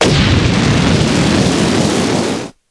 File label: shroom use; tf2ware ultimate; mariokart